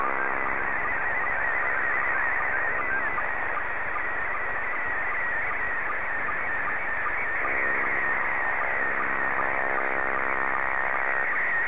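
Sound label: PD50, slow-scan television; BD3RAE; VK4MSL SSTV RX @ QG62ln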